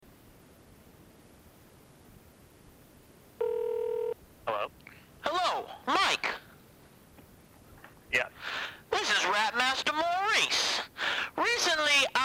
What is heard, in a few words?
Rap